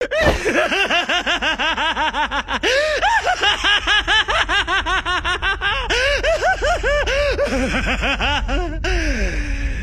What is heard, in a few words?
laugh; lightyagami